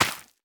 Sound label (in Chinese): 收获作物声